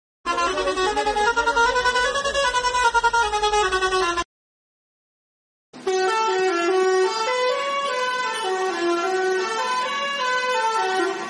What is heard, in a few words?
bus horn sound bgm